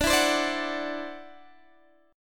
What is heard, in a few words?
E 7th Suspended 2nd Sharp 5th D, chord